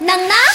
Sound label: Knock Knock